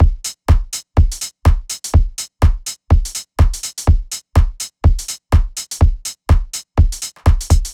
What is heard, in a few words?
House Drum Loop 124 BPM; Dance; 99Sounds Music Loops; 99Sounds x Monosounds